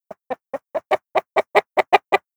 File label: chicken idle, creatures; Counter Strike Model Pack; CS:GO